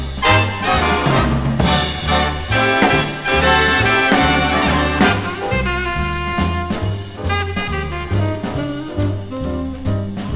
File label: Blues Sax Mono; BBC